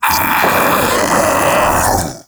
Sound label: Infested Splitter Joined Vocal Alerted; Grey Strain